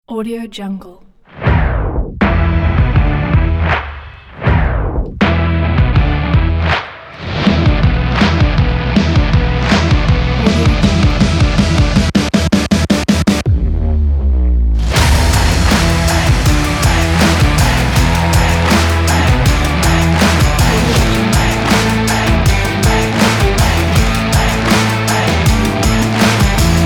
sport rock trailer

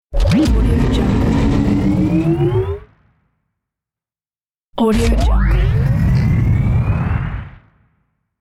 futuristic boost engine start

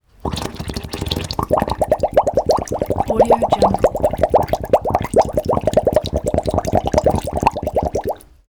drain bubbling liquid gurgle emptying drain; prosoundfx

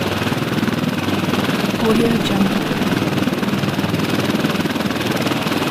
military attack helicopter idling

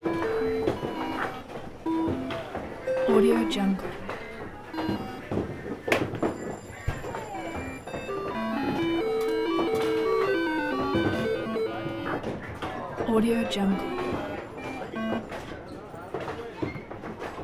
arcade game skee ball

